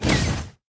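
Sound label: dragons enemy shadow basic cloak; sfx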